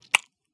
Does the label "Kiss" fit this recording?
Yes